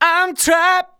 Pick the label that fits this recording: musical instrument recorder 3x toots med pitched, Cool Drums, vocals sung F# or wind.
vocals sung F#